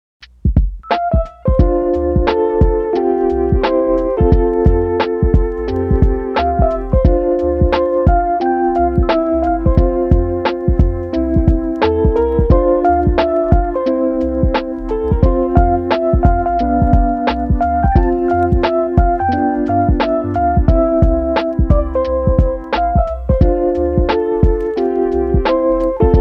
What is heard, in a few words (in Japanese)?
チルポップ / 可愛らしい / 心地良い / オープニング / ローファイ; チル・穏やか; フリーBGM